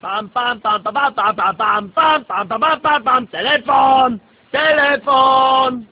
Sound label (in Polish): telefon